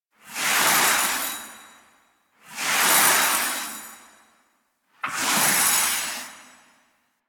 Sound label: Foley Idle Rabbit Pet; Ambience; Teshin; Duviri; Warframe